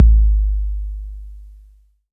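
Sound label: bass drum 808 Tape Decay E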